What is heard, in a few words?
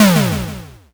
explosion s